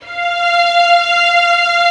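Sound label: Vio legato F4; Digital Sound Factory Virtuoso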